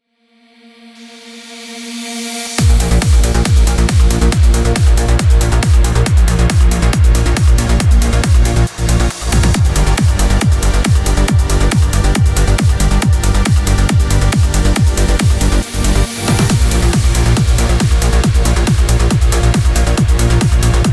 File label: Trance Kicks